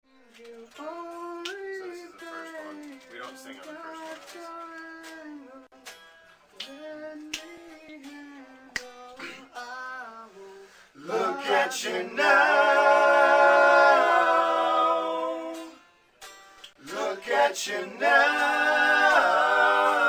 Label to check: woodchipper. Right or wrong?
Wrong